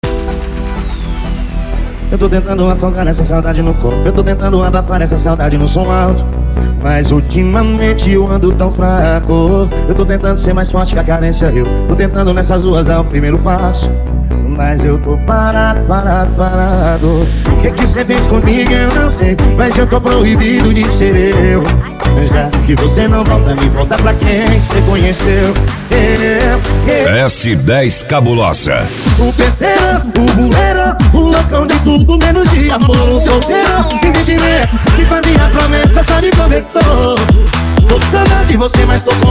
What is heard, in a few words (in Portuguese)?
funk comercial